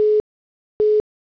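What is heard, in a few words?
call waiting; Motorola Q9h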